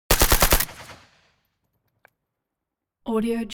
weapons rifle ak47 exterior fire burst close

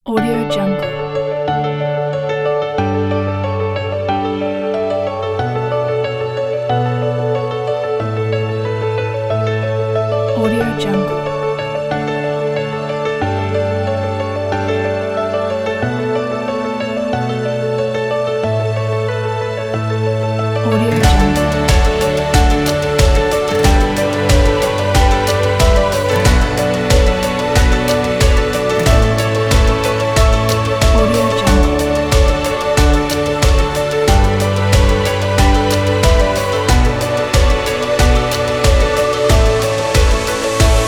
inspiring uplifting romantic piano

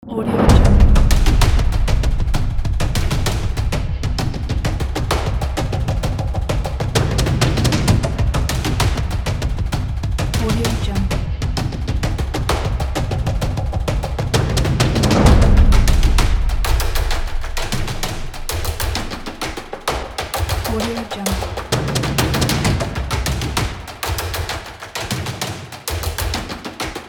cinematic percussion

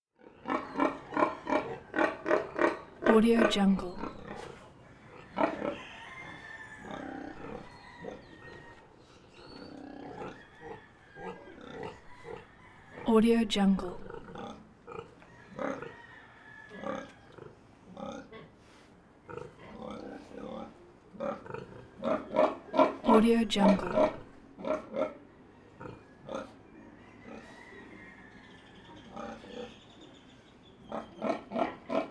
farm animals exterior large pigs grunt

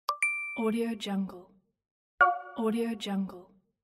mobile payment

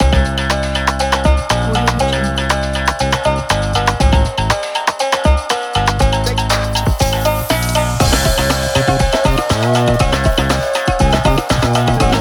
japan funky ident

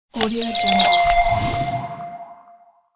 regeneration fruit spell

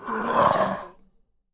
horror ghoul monster voice

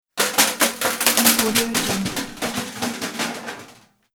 steel hvac pipe falling down stairs

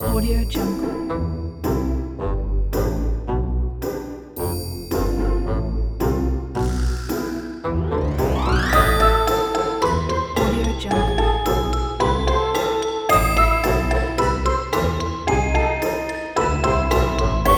quirky cartoon

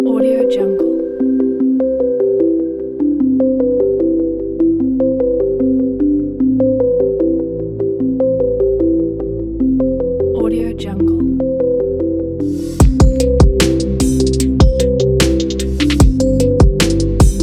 exciting ambient technology

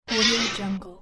power up

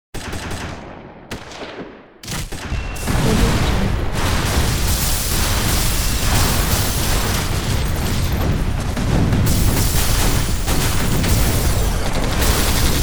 army war battle

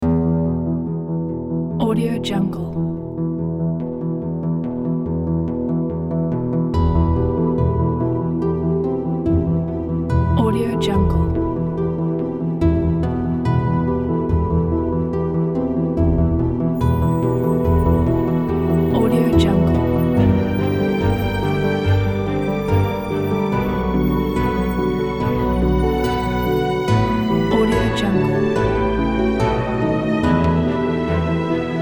dark hours intro

